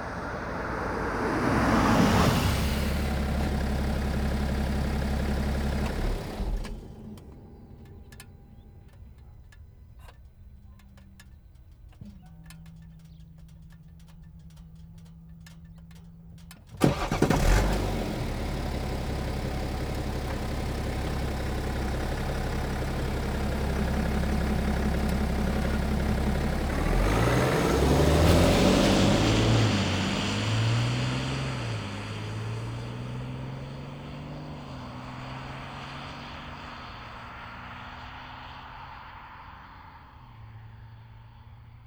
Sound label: BMW F12 640D Gran Coupe exterior wet asphalt fast stop engine off on and drive away static; mono